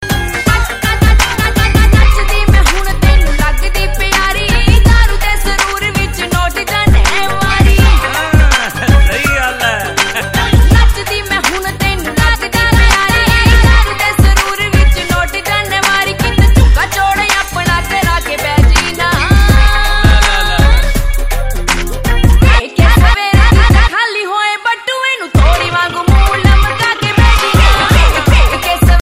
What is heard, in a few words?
punjabi ringtone